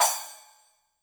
Splash Cym Brush, YAMAHA RM1X

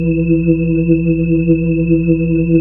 B-3 Chorus

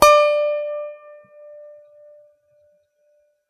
lap harp pick D5; Ethnic

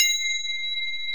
BEL, Orchestral Perc; Roland LCDP03

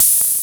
cymb; MTI AO-1

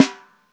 LOFI, snares; Spectrasonics; Burning Grooves

